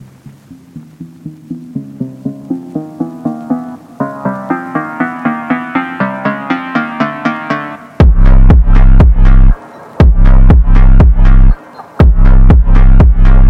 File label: Phonk House